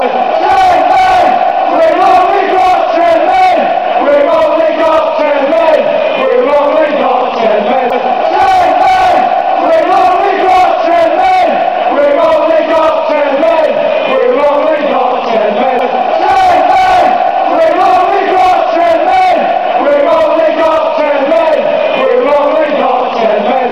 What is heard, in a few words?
weve only got 10 men, fanchants